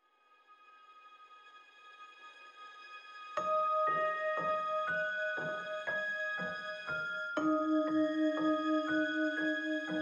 Music Loop; Agent A A Puzzle in Disguise; Windows

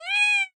Nya, Neko; neko atsume

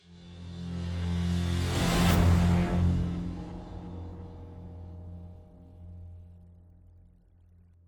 Action End Sting

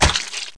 hit egg

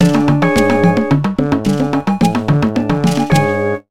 Sting Animal Fight; simanimals africa 2009 nds gamerip

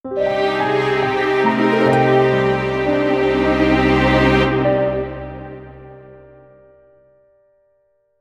Jingle Pvp Lose; dragonfangz 3ds ps4 switch xbox gamerip 2017

